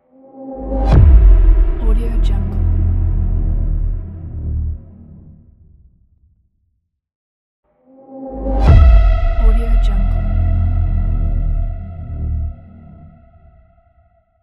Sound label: cinematic transition